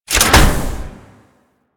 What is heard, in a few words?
Asteroid Drill Machine Pull Stop; Warframe; Ambience; Grineer Asteroid; One Offs